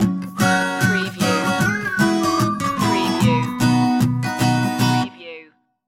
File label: stinger